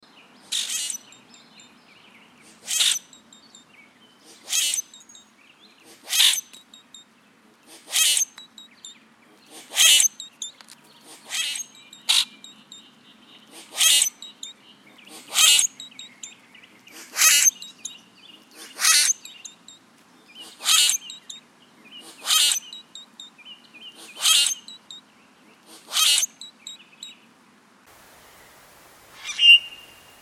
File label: Grackle Sound